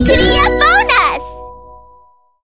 clearbonus

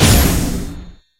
hit

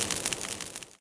firework

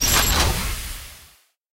lab training slot op

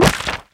click collect unit

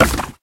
click giftbox

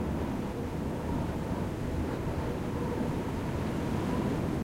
stage fail

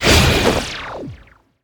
tower flag

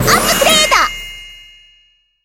upgrade

